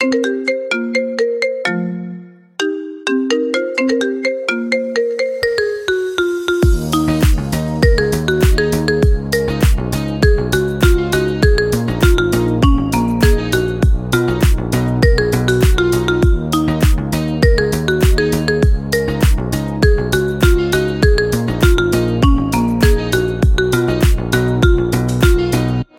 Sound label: marimba ringtones remix